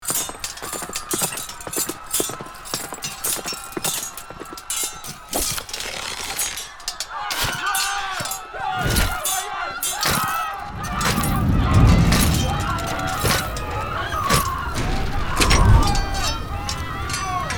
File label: Medieval battle fighting ambience swords screams and weapons